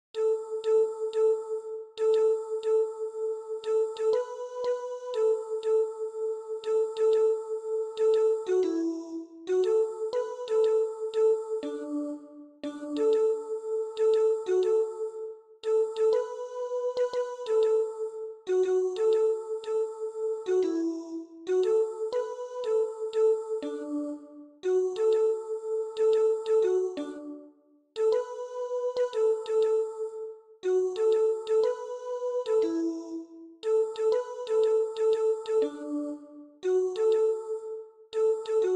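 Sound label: soprano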